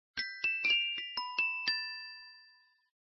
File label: Respiration